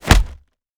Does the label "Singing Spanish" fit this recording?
No